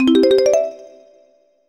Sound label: Collect Note; Banjo Kazooie